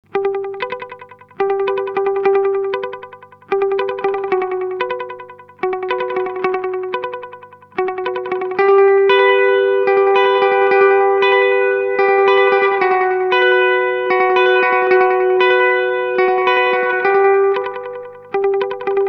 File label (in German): Earthquaker Devices Disaster Transport time 8 Uhr mix max mod speed mid mod mode bend